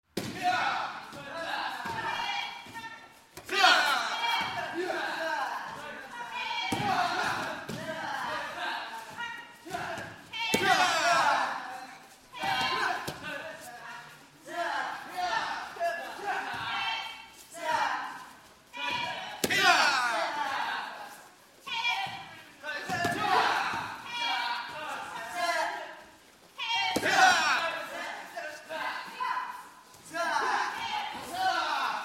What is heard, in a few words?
Karate Class Ambiance; Hollywood Edge Martial Arts and Human Impacts